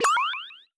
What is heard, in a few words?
exclamation mark